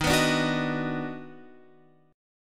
Eb Minor Major 7th Sharp 5th, down, Guitar, Open F; strummed; chord; JGuitar